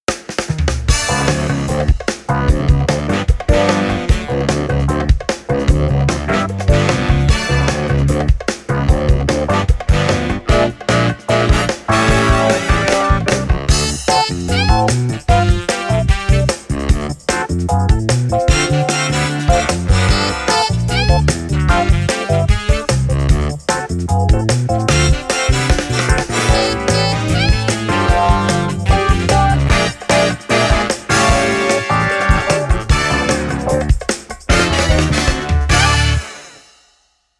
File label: 150B